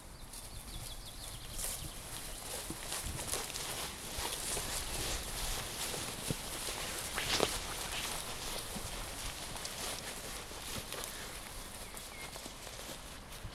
marcheurs dans l herbe

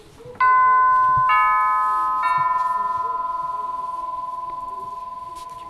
sonnette de l entree; Les ecoles

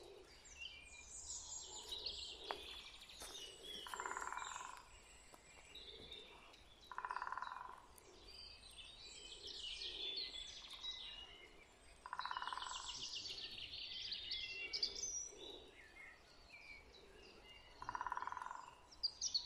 Pic vert

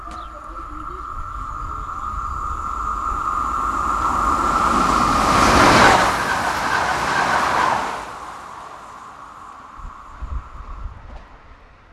passage d un train; Le bourg

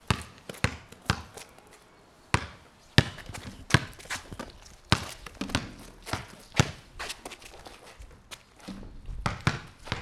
ballon de basket; Les ecoles

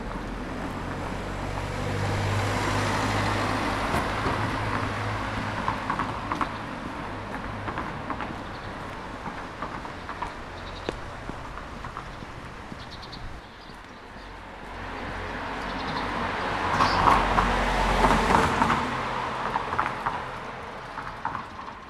passage de voiture; Le bourg

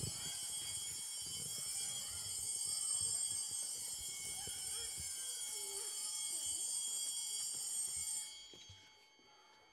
Sonnerie de l ecole; Les ecoles

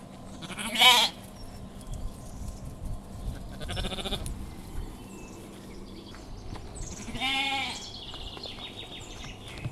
moutons; de l Espace des plaines; Paysages naturels

